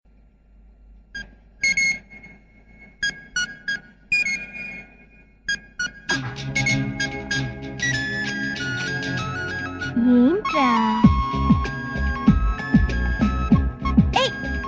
Cute Ringtone

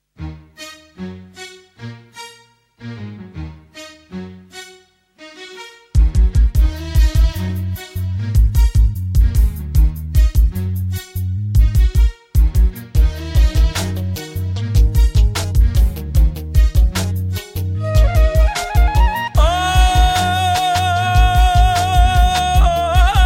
Punjabi Old Skool

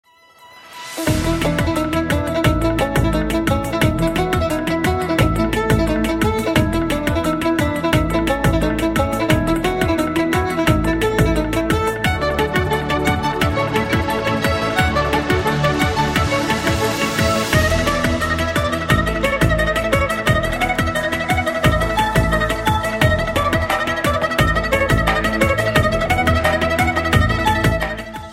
Hungarian Rhapsody

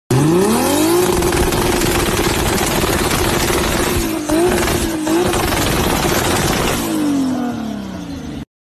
AK47; 2jz

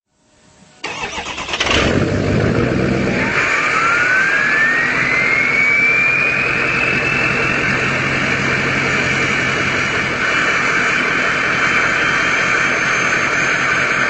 60 L Powerstroke

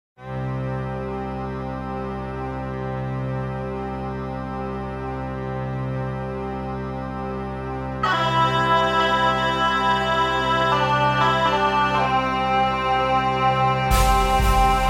Bigpipe

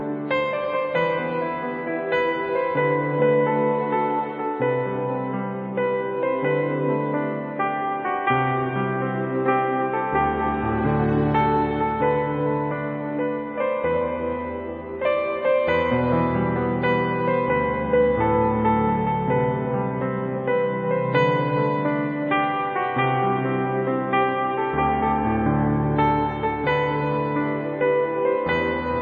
Instrument

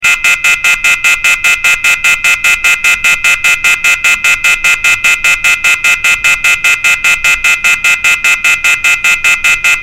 Phone Off Hook

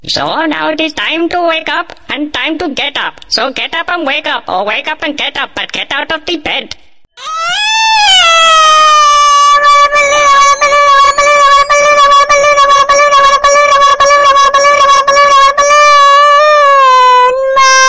Full Alarm Galaxy S5

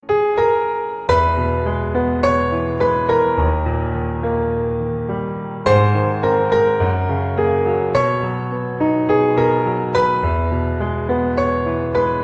Piano

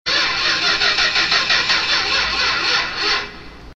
Car Self Start Sms

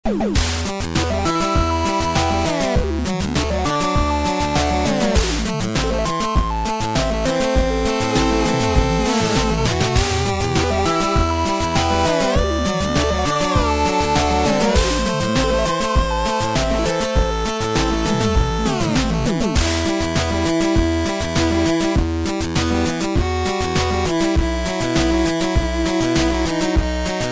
8bit

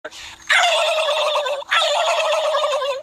Turkey Sound

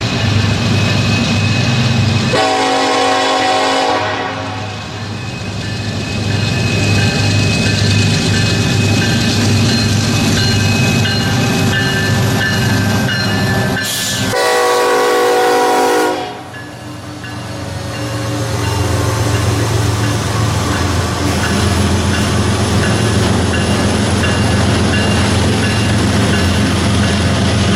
Train Horn

